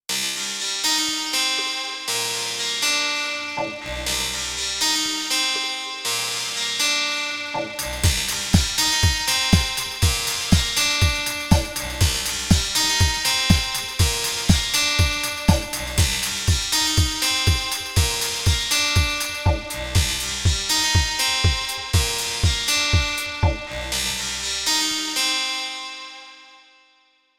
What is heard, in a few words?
Resonator Harpsitar loop